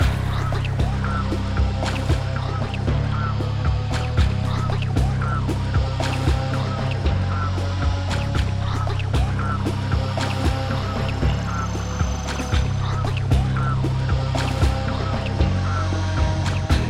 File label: Swamp